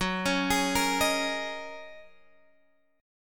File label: Gb 6th Add 9th Gb down Guitar Open G